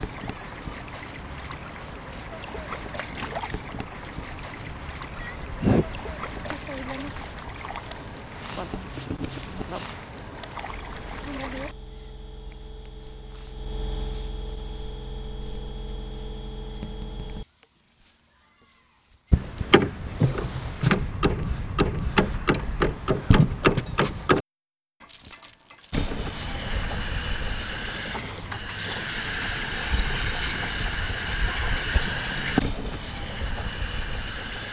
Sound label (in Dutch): ELEKTRISCHE GELUIDEN POLITIEPOST; BIJ HET WATER; The neighborhood as a concert hall